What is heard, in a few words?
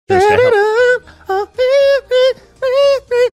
singing something with higher pitch